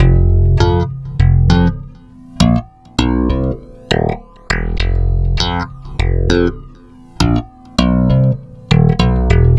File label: Stick Loop, Stick Bass